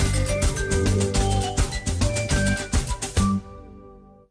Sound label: advertisement jingle